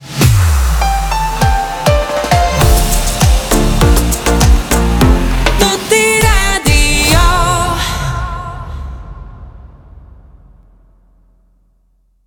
Transition Slow Medium; Toti Radio 2023

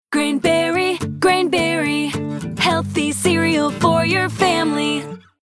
Jingle; Grain Berry - Benztown

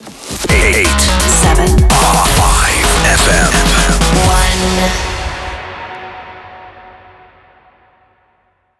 Jingle 128bpm Fm D Rapid Fire; 87.5 FM1 Manila

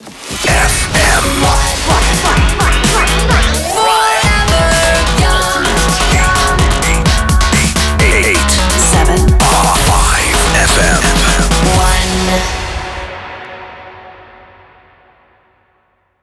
Jingle 128bpm Fm Main ID; 87.5 FM1 Manila

